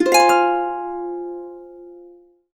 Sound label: Harps; USB Soundscan vol.62; Complete Harps; AKAI